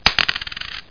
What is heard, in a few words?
drop